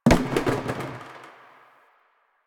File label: Plastic Hit; Warframe; Ambience; Nineteen Ninety Nine